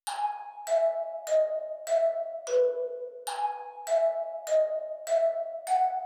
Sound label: Nostalgic Melody Loop 150 BPM C# Min Pluck; Loop Stems; Cymatics; 2020 Melody Collection